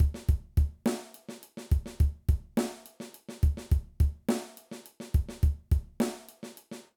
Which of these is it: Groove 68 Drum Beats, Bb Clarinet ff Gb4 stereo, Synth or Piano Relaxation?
Groove 68 Drum Beats